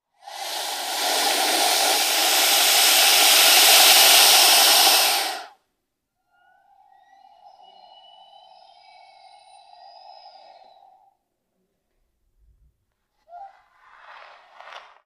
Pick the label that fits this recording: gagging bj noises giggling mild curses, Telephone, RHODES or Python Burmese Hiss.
Python Burmese Hiss